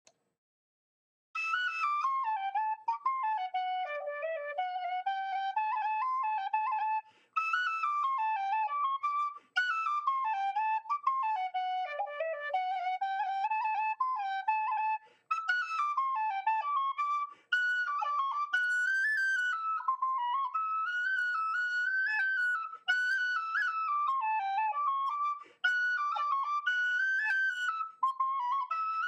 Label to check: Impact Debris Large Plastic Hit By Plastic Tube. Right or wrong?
Wrong